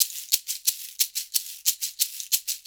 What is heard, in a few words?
90 SHAKER